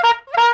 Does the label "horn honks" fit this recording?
Yes